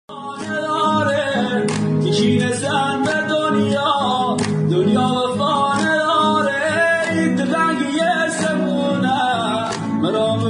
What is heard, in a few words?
Guitar